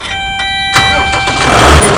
start up; fortis

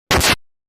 hit; pizza tower

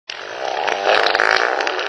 fart

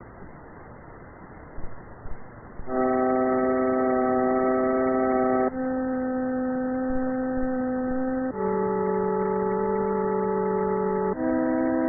music horror

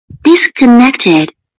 disconnected speaker

